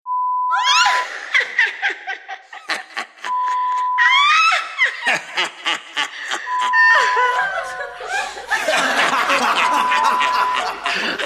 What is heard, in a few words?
Laughing at You; Horror Sound Effect; Lo-Fi